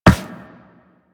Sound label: Run Metal, Footsteps; Warframe; Operator